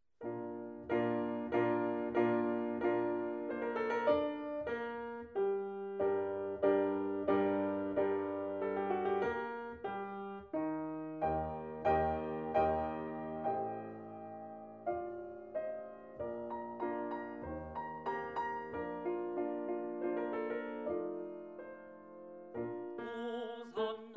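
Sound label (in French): basse; benedictus; schola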